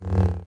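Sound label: lightsaber jedi swoosh medium; weapons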